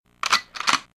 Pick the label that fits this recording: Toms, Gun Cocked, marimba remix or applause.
Gun Cocked